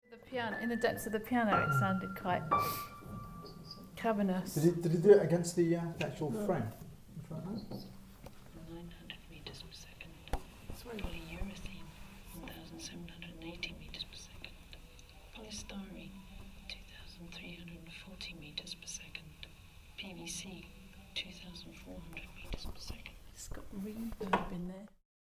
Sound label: voices in piano